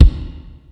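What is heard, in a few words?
Kick, Yamaha SY22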